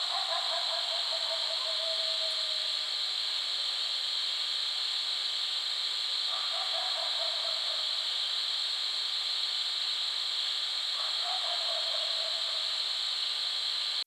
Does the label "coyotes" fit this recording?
Yes